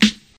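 fluffy acoustic snare sound a key, tuned to the A note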